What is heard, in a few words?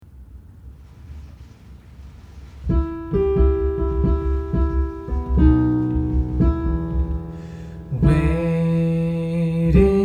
Wade in the Water soprano